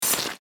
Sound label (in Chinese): 死亡